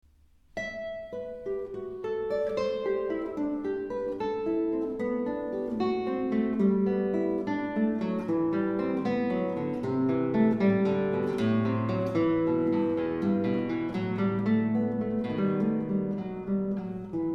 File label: Prelude; Cantilena